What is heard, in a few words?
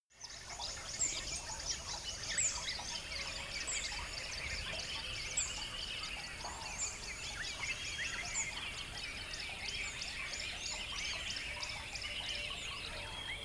river dawn